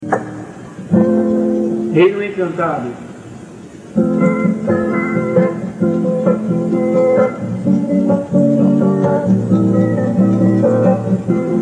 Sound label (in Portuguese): violao e voz